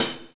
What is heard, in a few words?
Breath HiHat; Sonic Foundry (Sony Creative Software); Drums & Drones; Construction Kits